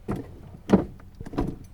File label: Gas Pedal On Mercury Zephyr Sedan